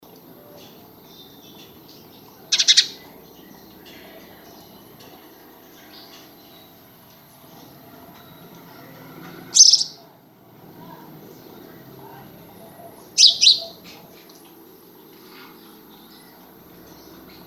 Passer domesticus; SETE

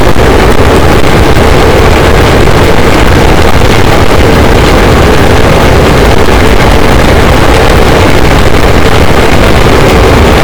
cachalot, boat; Physeter Macrocephalus; SNR 0dB

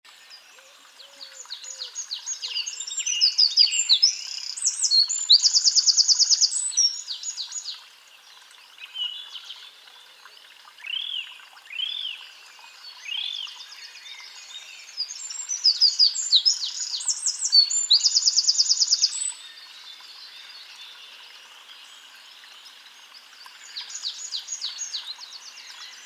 Troglodytes troglodytes; SETE

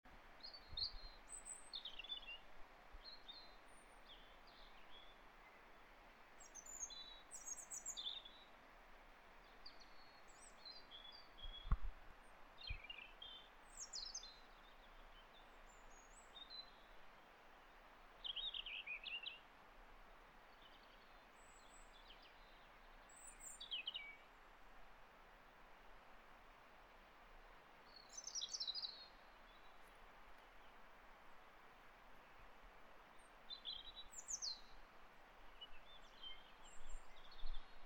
Erithacus rubecula; SETE

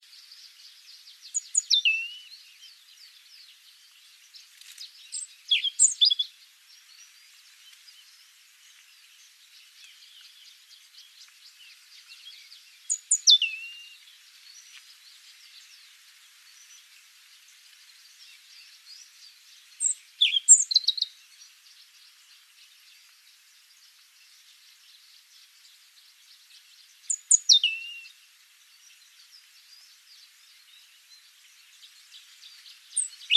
Erithacus rubecula; SETE

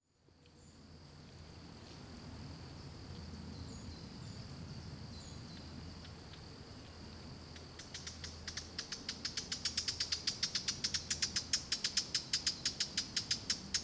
Sylvia atricapilla; SETE

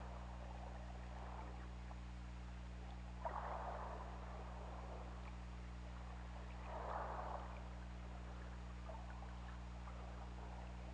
Orca; OrcaLab

